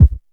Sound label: short kick drum sample D# key, Royality free kick drum sample tuned to the D# note; kick drum samples